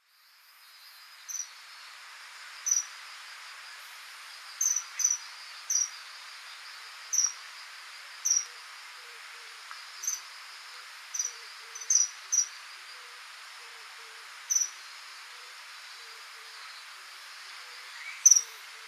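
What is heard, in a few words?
Common Kingfisher; kawasemi; saitama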